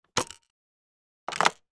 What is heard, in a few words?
toy telephone pick up receiver then hang up